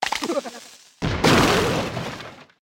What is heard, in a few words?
Bomber; Clash Clans